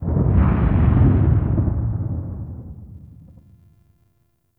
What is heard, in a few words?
Sentient Vessel Step Delay, Enemies; Warframe; Tau; Movement